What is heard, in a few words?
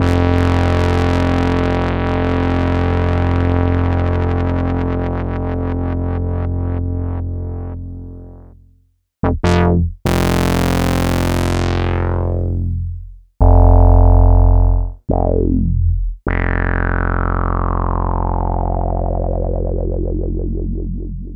EMS VCS3 Bass